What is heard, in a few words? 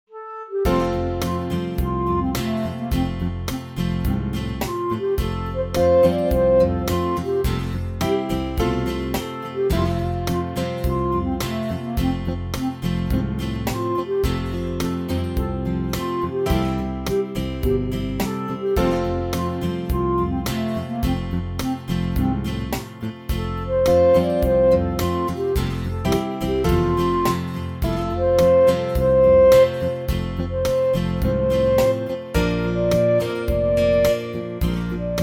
Turkey in the Straw Karaoke